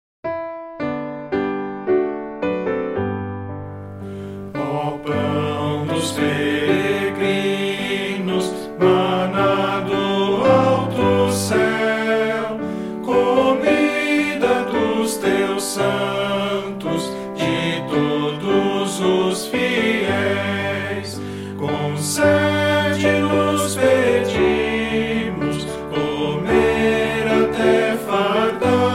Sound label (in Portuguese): voz piano